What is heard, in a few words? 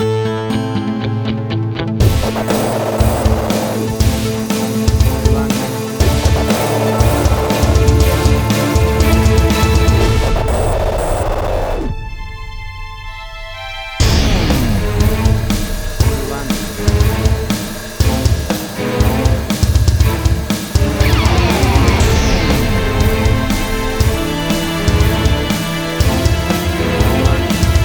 Big Action Trailer Music